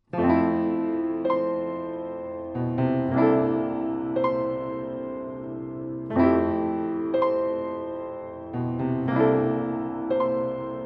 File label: Piano